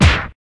tic bar

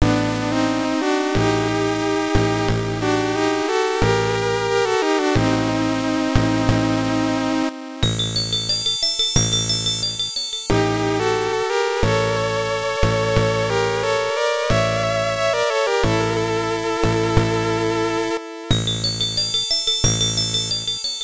All Clear; King's Valley 2; MSX

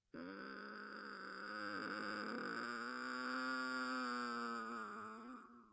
groan

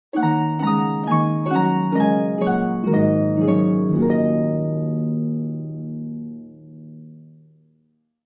Greece Music; Civilization Revolution; Xbox

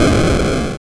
Flamethrower (Sound); gloom 2017 gamerip

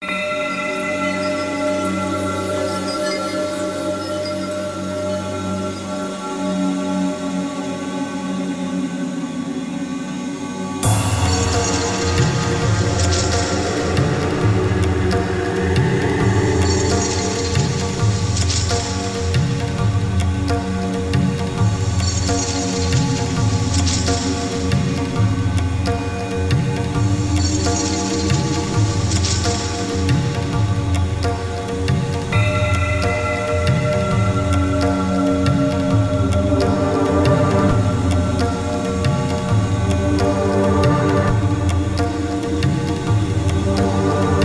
Indian Drone; Z Asmut; Dead Hand

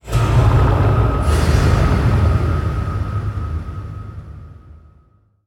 Stinger; PlagueOfMurlocs

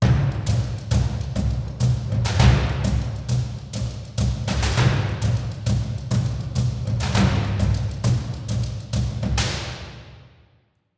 Drums; Necropolis

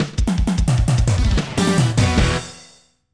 Stage Clear; Langrisser Dramatic Edition; Saturn; Stage Clear 1 (alternate)

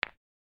Stone Landing; my cute commissar 2020 gamerip

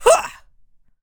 teleport; sora

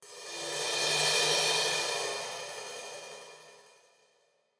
Cymbal Swell; gamerip; Skylanders 4 Trap Team

